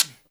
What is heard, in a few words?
GUIRO; InVision Interactive; Percussion Slam; ITCHY